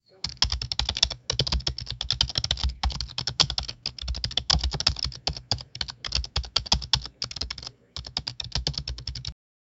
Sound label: college class ambience, classroom